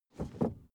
suv 1st gear release soft; verkehr transport; autos

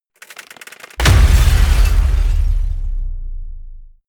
smash, baugewerbe

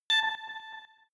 bing delay high; kommunikation; signale

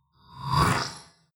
swell short industrial; sweeps-swells

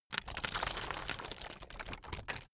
playing cards drop; comedy-cartoon; spielzeug

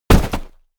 sand bag drop; impacts

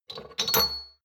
shot glasses pull out hard, gastronomie; berufe; soundpool

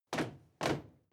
step crowd march loud stop; schritte

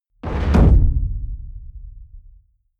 heavy sub noise hood

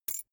key ring small catch; objekte; schluessel

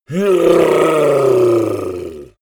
yeti growl angry painful; kreaturen

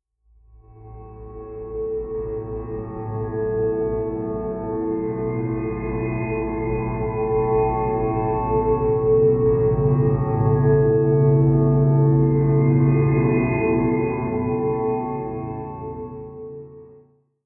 atmospheric peal deep, drones; musikelemente

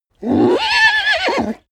horse neigh loud; natur-tiere; pferde

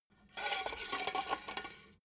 metal scaffold pole slide

